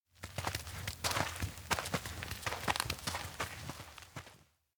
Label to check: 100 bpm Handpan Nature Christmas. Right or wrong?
Wrong